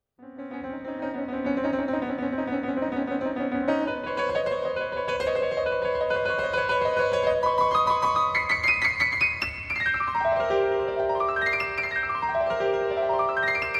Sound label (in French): Feux d'artifice; C.Debussy; „Fejerverkas”